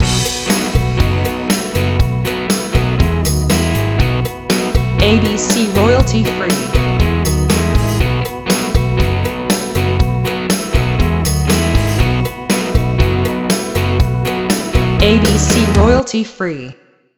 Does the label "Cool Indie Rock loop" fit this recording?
Yes